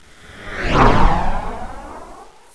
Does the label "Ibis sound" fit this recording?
No